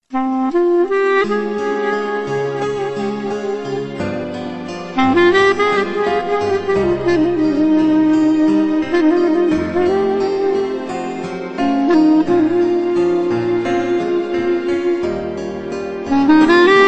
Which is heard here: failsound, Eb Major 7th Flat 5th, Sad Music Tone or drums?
Sad Music Tone